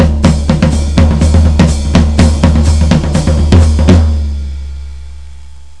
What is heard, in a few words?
Drums